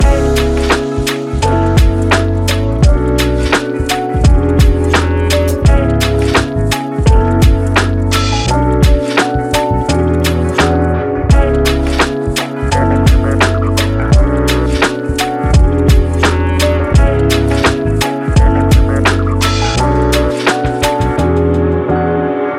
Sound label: sweet vlogging loop